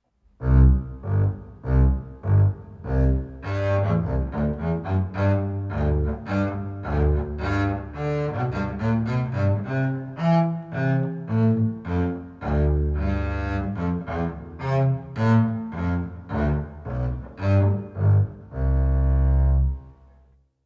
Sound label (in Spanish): contrabajo